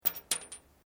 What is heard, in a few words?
Glass movement minor; Material components for constructing more complex sounds; Materials